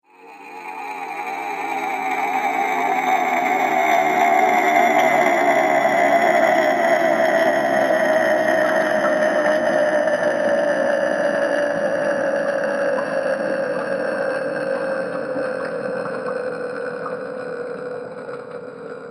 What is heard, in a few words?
Strange Falling Horror Sound; Scary Soundscape Sound Effects; Andrew Fleming